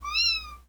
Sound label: Miyaaav